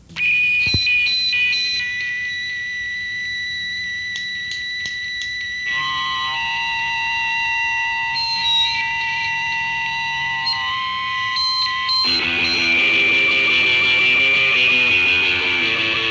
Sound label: Noise